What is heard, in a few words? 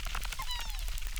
Chirp, acquarium